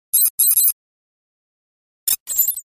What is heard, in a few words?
information read signal; radio signals